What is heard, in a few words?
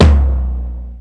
lo tom; linn